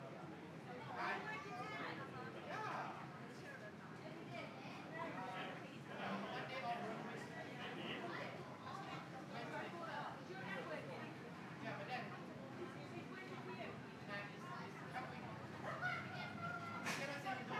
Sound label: Ambience Shop Base; Backgrounds